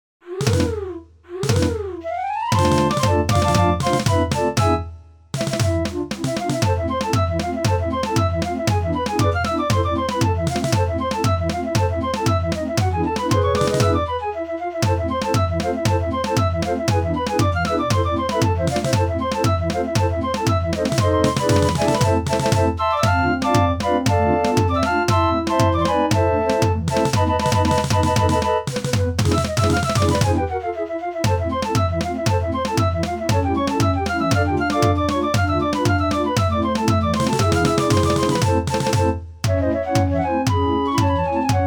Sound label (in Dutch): samba, draaiorgels